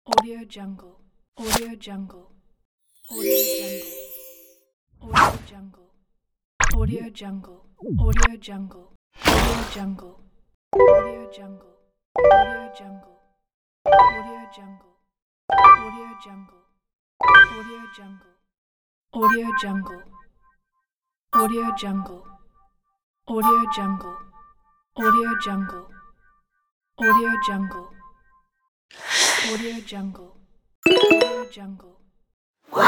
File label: mobile game pack